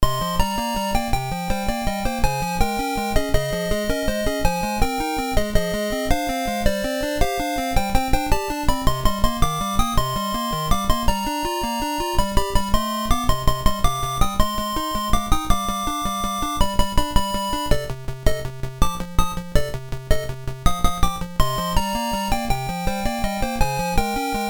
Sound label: Underwater; Penguin Adventure OST; Audio FlashJacks